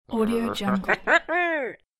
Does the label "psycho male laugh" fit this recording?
Yes